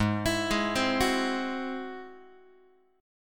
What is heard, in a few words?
G sharp Augmented 7th G sharp Guitar; G#+7 arpeggiated; x,4,7,6,4,5; JGuitar